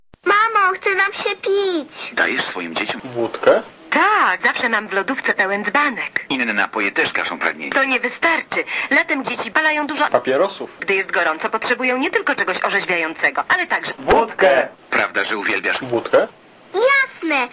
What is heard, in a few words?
Advertisement Trendy Ringtone